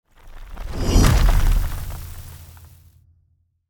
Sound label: Stone Door Shuted